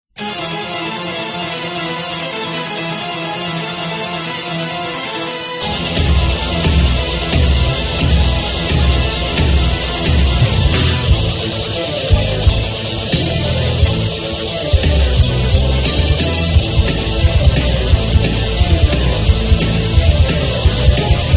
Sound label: Martial Arts (Instrumental)